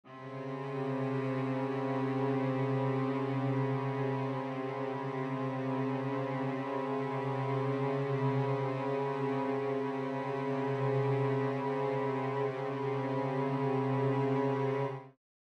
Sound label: Flatted 2nd in C, String Ensemble